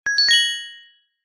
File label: multimedia game sound gem treasure found